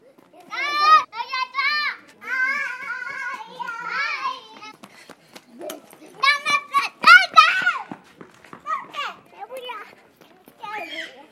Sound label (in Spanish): NIÑOS JUGANDO EN PLAZA DE CHIVAY